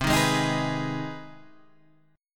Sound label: C Minor Major 7th Sharp 5th, C; CmM7#5 Chord {8 6 6 8 9 7} strummed